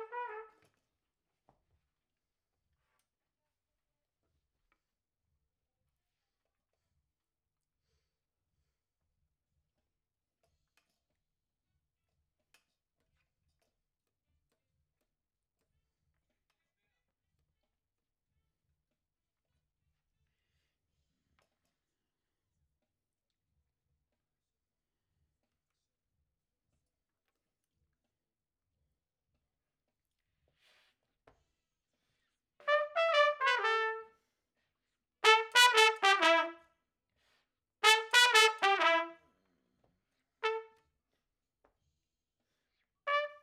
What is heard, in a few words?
Trumpet Room